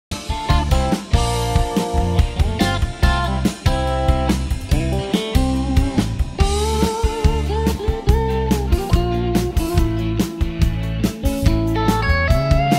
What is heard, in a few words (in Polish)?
solo tempo troche wolniej